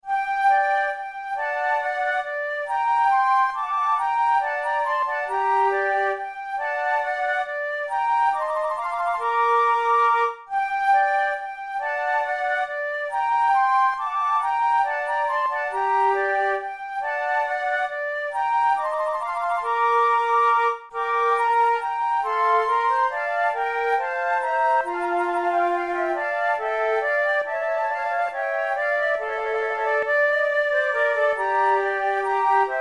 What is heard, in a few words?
flute sonata menuet; mattheson